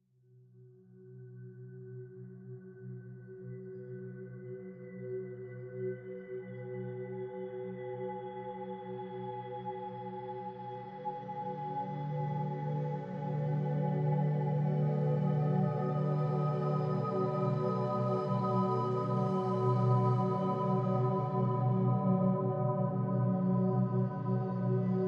ocean; MC survival

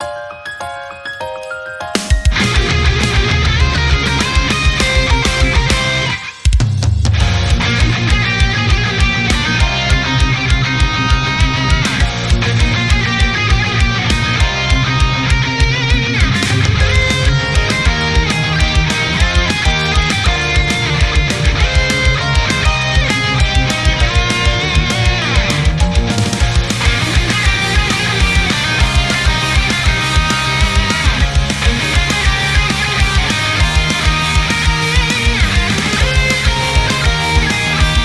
Battle

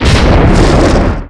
Thunder

